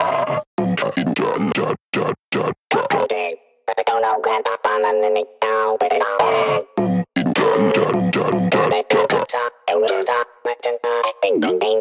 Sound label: Voices